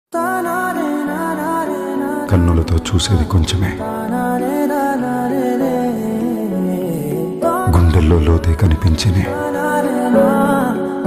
love ringtone love bgm ringtone; ooru peru bhairavakona

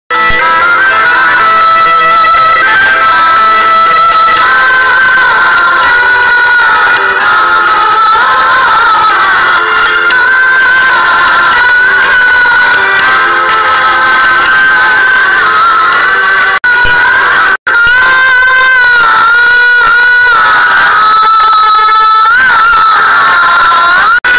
love background music; Ajay Devgan; Ishq film

